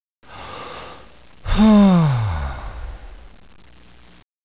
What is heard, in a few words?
sigh